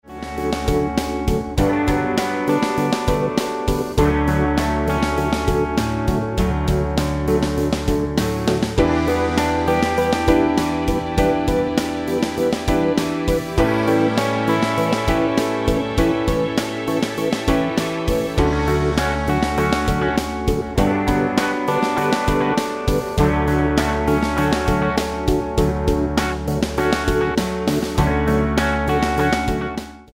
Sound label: Bass Shoegazer